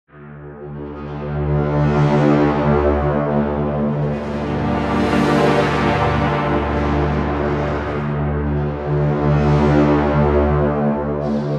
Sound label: Brass Designer Ambiente Combo